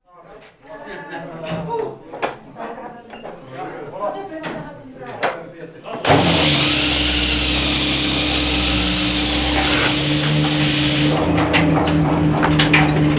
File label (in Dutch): molen